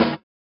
SWUSH; Spectrasonics; Metamorphosis

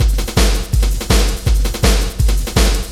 LOOP, Eurotech; Sounds Good

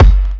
Kik; Rawcutz - Nu Forms

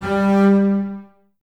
Basses G 3 Detache; String Ensembles; Miroslav Vitous; 90 s Sample CDs

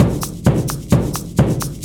oildrummer; Zero-G Deep House; 130bpm

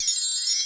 RING, DRUMS; Crypton - DrumBase5000; T1 DRUMS

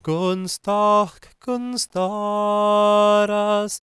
GAELIC, World Voices; ILIO; Vocal Planet

